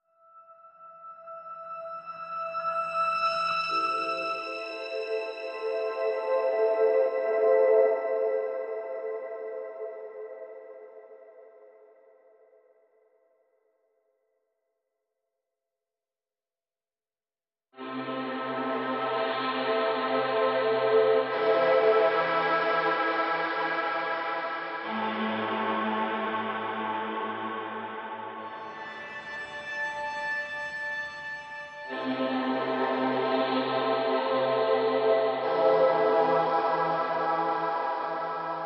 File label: Pads Only